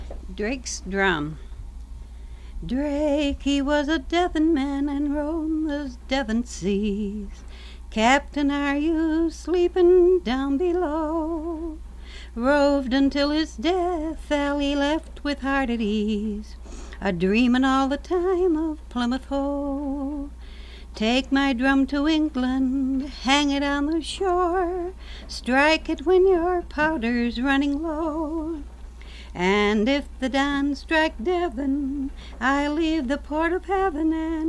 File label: Drum